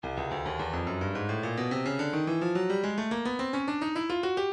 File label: breakbeat midi 107 bpm; zolar plexus c; Stylus Breakbeat Midi Files